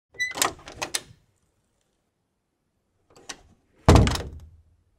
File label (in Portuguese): porta abre e fecha